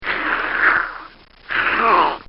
Dog Growling; Dogs; Animals; recorded in a custom built sound booth

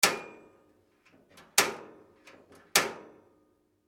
household fire gas lighting flint; Gas Fire Being Lit; Furnaces; McKinney Sound

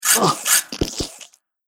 Blade Attack Chopped To Bits; Battle Sound Effects

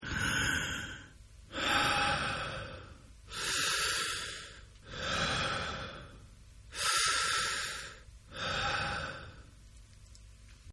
Breathe Thru Mouth Male; Breathing; Human; Partners In Rhyme

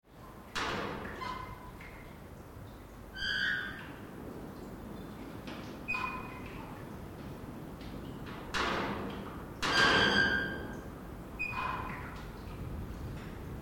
Wind in Metal Shed, Metal banging of doors, drips; Weather

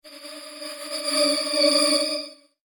Some Horror Tension, scary soundscape; Scary Soundscape Sound Effects

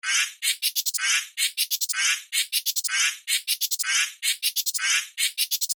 Strange Speeding Up Loop; Science Fiction Sound Effects; Andrew Fleming

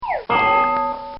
Whip And Bell; Bell Sound Effects; Musical; recorded in a custom built sound booth

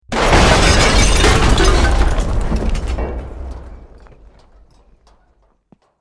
EXPLOSION LARGE BRICK WOOD GLASS METAL; Created explosion effects, house, building or vehicle effects; Bomb; Bombs

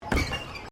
Footsteps Boots Suspension Bridge Wood Wire Step

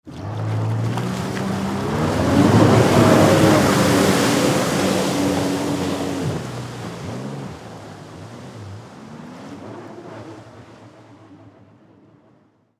Vehicle Jet Ski Ride Away; Boat; Vehicles

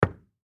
Door Glass Knock; Door Glass Knock Single; Office; Blastwave

